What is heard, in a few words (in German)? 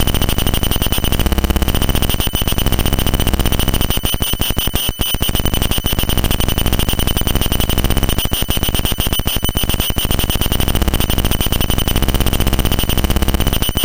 rfid handheld; vdb 7 emv-tagung